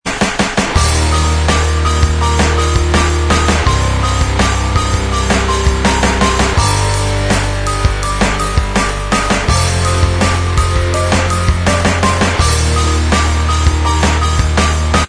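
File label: instrumental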